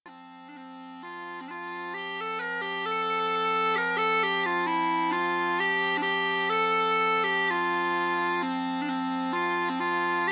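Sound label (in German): Hümmelchen F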